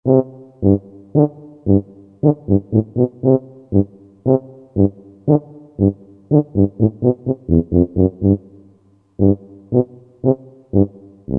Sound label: Solo Tuba; World; Latin; Roland SR-JV80-18 World Collection Latin